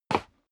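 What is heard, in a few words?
step leather shoes wood creak slide short, footsteps; schritte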